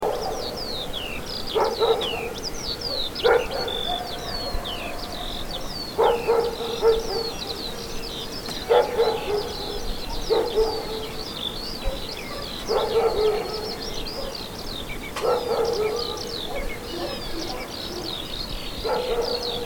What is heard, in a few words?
Dog And Bird Sounds